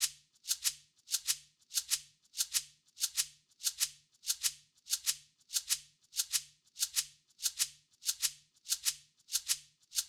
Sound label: Shaker, 095 Bmin, Drum Tracks